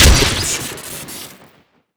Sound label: sentry shoot crit; tf2classic